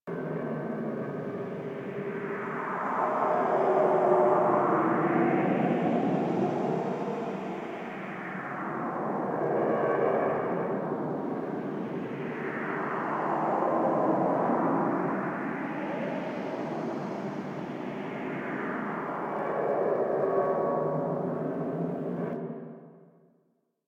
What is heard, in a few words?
Interior Cave Small Bed Loop, Ambience; Warframe; Infested Eris